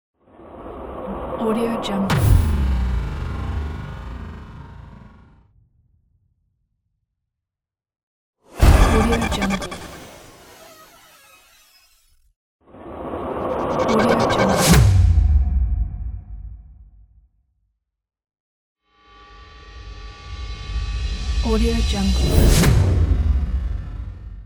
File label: magical spell sound pack